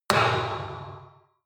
metal impact can large ring hard

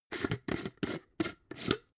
jar glass plastic open

